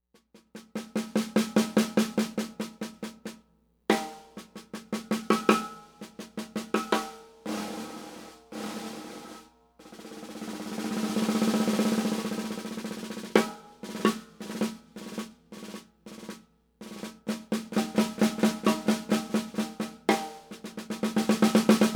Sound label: Sonor Ebony